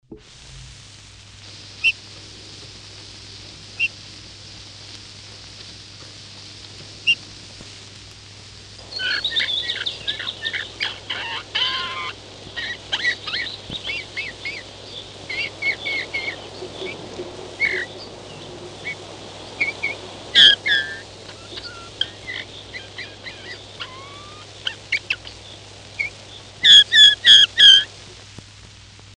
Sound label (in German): Dompfaff; Vogel; hoerstation vogel wg platte 2